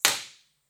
IR

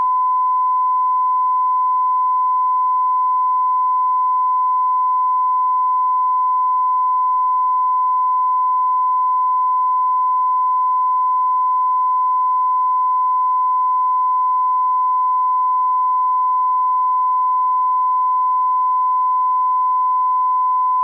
Calib 94dB; BK Zoom F6; Gain=+30dB; Ambimik

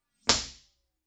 impulse response; Corso acustica

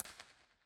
Scala Right; IR Scala; Mono

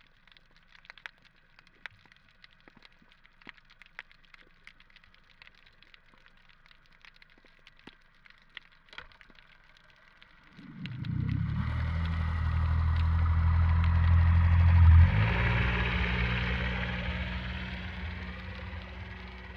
Boat Passage, Underwater; Trieste; B-format

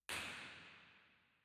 Auditorium, Theatres

